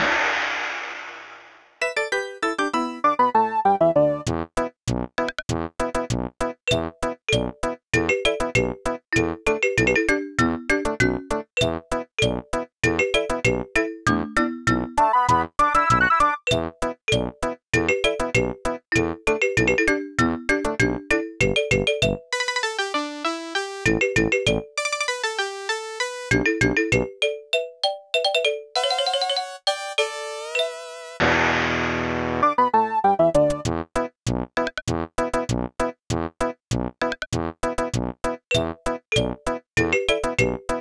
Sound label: Aircheck, Hi-Fi